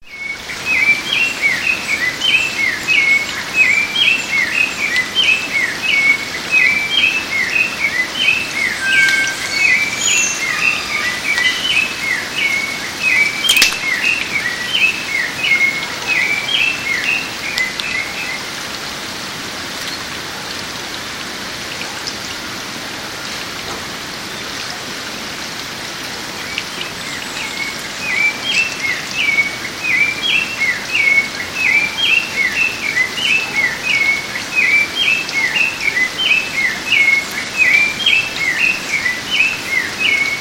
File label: Turdus rufiventris, Mongagua SP; Pco Anta